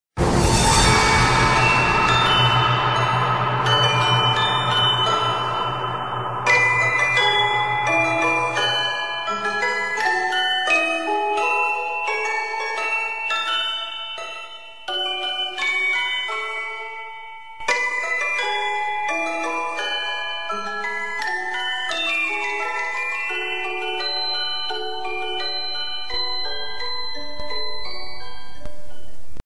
ice cream truck jingle

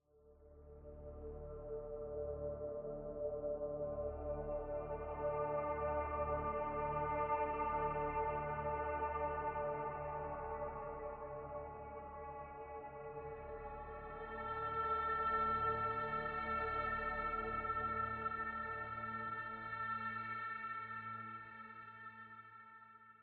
ambient

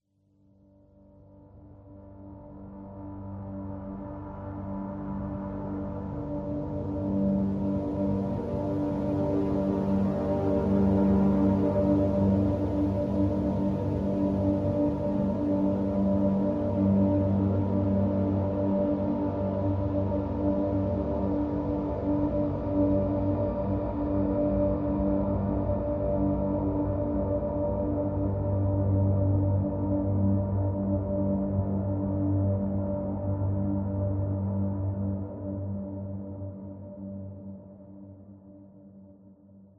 ambient

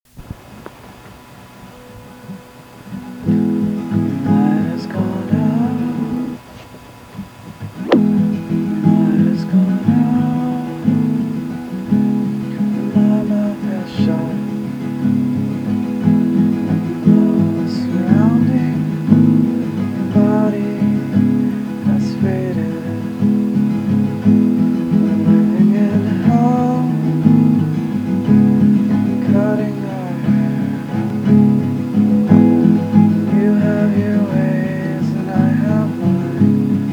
acoustic

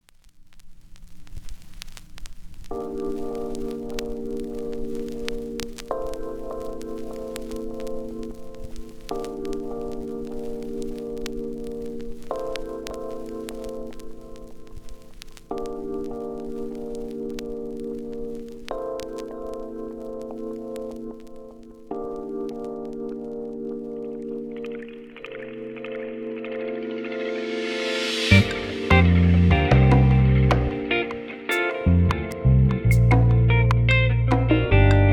water delay

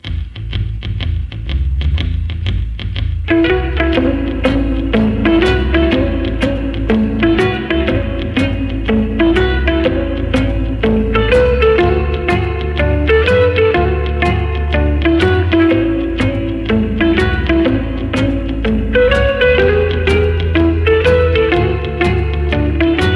blues instrumental

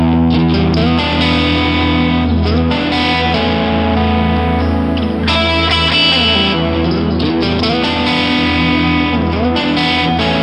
hip hop blues

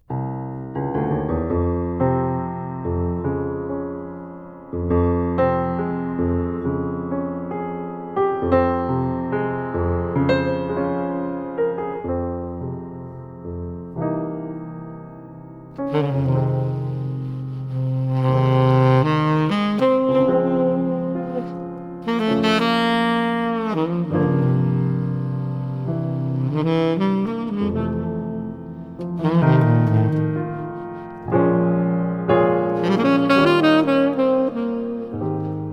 instrumental

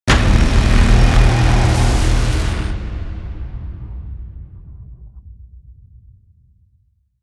surge hit big

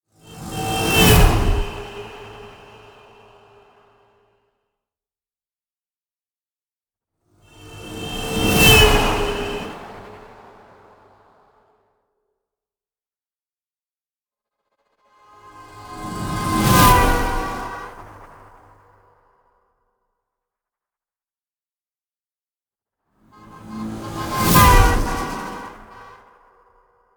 whoosh rush hour

